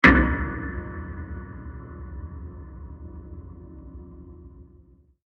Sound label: Deep Metal Impact Burst; Bursts; Impacts; Crafted Signals